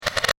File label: Ripple Flicking Transition; Transition Sound Effects; Andrew Fleming